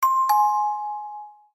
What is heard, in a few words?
chime; maoudamashii